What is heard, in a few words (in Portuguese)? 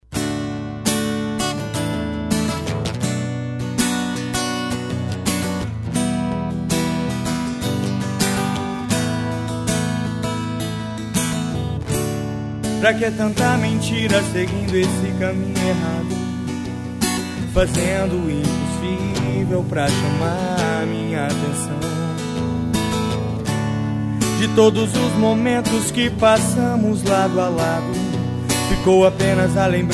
acustico sertanejo